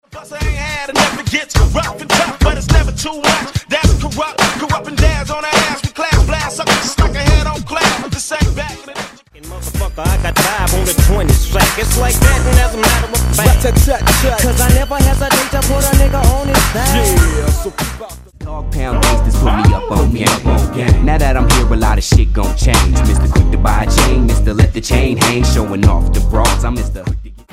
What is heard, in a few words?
Old School Hip Hop RNB Mini Pack